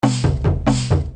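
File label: baraban, drum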